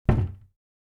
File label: cabinet door close